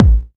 Bassdrum; Best Service; House Definition VOL-1

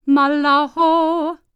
NEAR EAST, Vocal World Voices; ILIO; Vocal Planet VOL-5

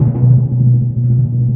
Orchestral Perc, Timp FX

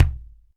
Kik Lo Wood, Drum Machines Alesis D4

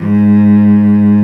CELLO G#2, SOLO STRINGS; Greytsounds VOL-1

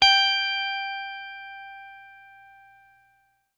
BLUE POP, Guitar & Bass; Quantum Leap; 90's Sample CDs

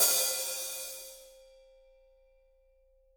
HH, Drums; Bob Clearmountain Drums I

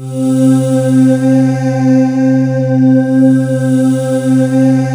VOCAL PAD; Sound Gallery - Charged

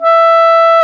WND CLARIN, Clarinets; Clar Bryt

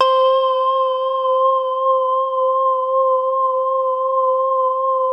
SOFT C5; FINE ART 3

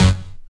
JAM SYNTH, KEYS

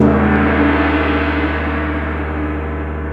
GONG; Crypton - DrumBase5000; CYMBALS

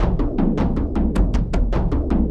Frame Settle, Kendang; Sonic Foundry (Sony Creative Software) - Friendly Fire; Drums

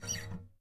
SQK, guitars; Spectrasonics; Hans Zimmer Guitars VOL-2; FNGSTYLE PFM

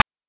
PITCHED NOISE; NES DPCM; MIDINES

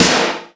SD, Yamaha RY-10, Drums

